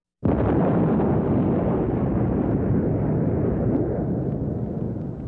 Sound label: rocket launch